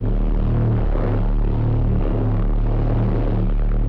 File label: didgeridoo didjeridu low dark